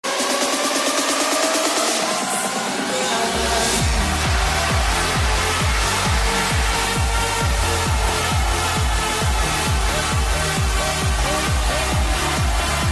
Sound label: trance ringtone